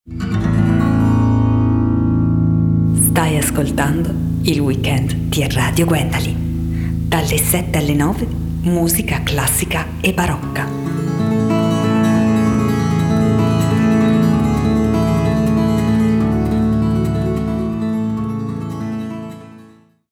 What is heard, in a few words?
Jingle; Week End Classica